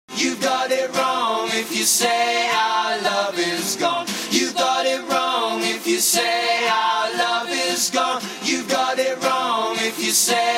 Backing Vocals

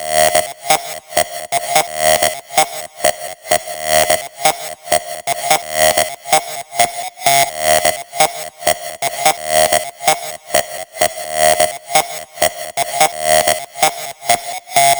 128BPM Lead Loops Sidechain - D#; MELBOURNE DROP VOL. 4

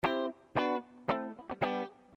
Guitar

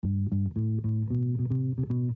Bass

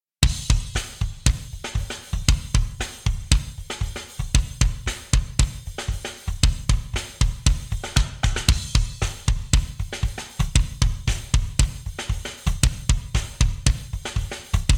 drums

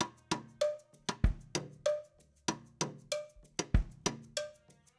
Kit Intro Pt2 Verse1

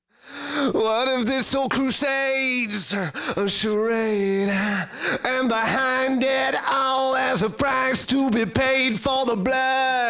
lead vox

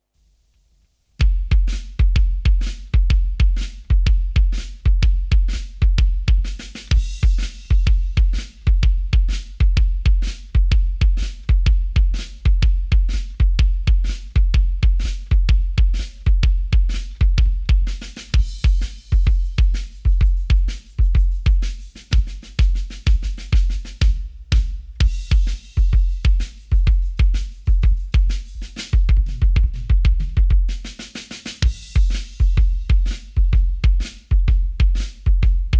drums

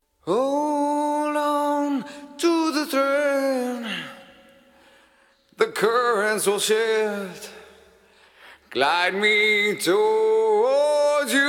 vocals

